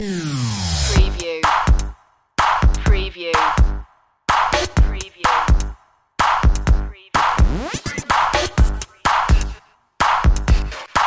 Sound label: glitch rocker